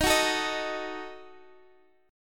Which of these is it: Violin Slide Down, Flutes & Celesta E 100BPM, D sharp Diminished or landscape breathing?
D sharp Diminished